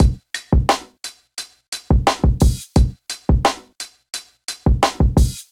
Kit, Golden Era Hip-Hop Drums; Coldblood; Ableton; Sound Oracle

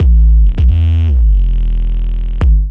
Boom Kick A Minor 88bpm, Bassline; MIDI Clips; Command; Ableton; Factory Packs; Chop and Swing

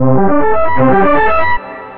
Chord, synth rhythmic; Ableton; Skitter and Step

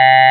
android, Digital Synths

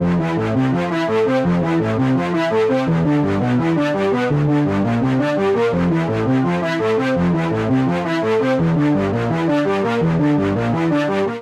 Electric Pop C Major 84 bpm; Bassline; Tonal; MIDI Clips; Drive and Glow; Ableton; Factory Packs

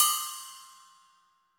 Cymbal Bell; Latin Percussion; Drums; Drum Hits; Ableton; Factory Packs

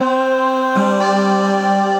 Four Voice Ethereal Choir; Chromatic Voices; Choral Voices; Ableton; Factory Packs; Voice Box

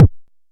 analog attacked, Kickbank

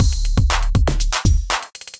Electrified Kit, Drums; Electrified Kits; Ableton; Bomblastic

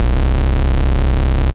Dirt, Analog Bass; Ableton; Factory Packs; Retro Synths; Analog Bass Vol 1

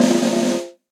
Snare Press; Snare Articulation; Drum Hits; Cop Style; Session Drums Studio; Standard Hits

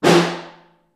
SN Far, Snare

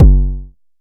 analog tonal, kick; Ableton Foundation FreePacks

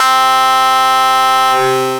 Vocoder Plucks, Synth Lead; Bass; Ableton; Creative Extensions

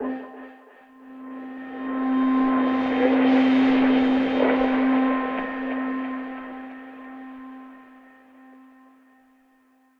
Layer Heaven, Strung Together

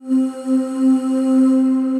Tabernacle Voices, Disembodied Voices; Instruments; Ableton; Mood Reel

